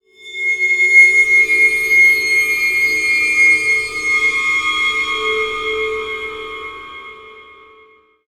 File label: Waterphone Shine